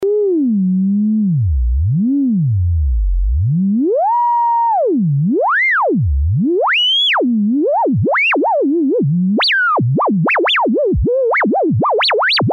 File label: cosine interp; Quad lfo